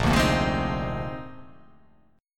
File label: A sharp 11th